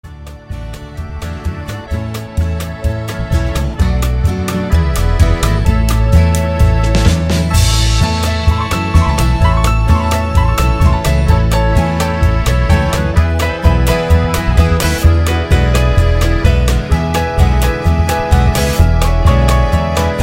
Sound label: Polka Patter